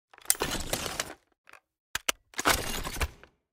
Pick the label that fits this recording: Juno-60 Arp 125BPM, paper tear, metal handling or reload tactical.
reload tactical